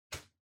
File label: Lighter (Far-Flick)